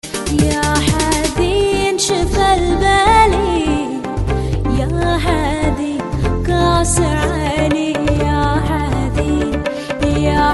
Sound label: arabic ringtone